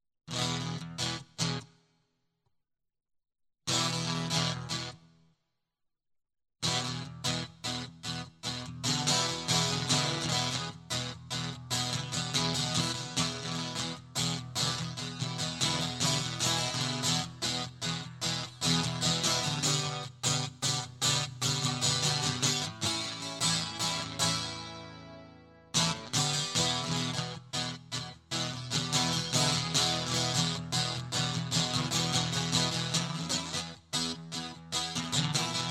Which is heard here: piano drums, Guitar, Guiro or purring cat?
Guitar